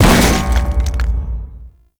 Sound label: Gatling Gun Debris Impact; Gameplay; Warframe; Tau